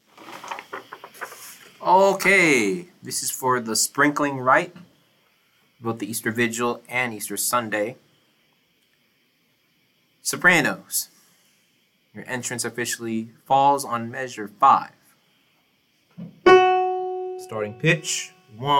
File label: Soprano; Chamber Choir